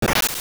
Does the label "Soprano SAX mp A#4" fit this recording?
No